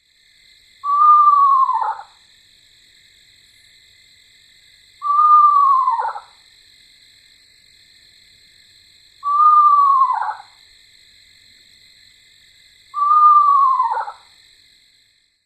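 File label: Eastern Screech-Owl whinny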